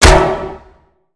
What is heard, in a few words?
Robot Get Hit Dry; Lego; aronwk collection; ndaudio; creatures mechanical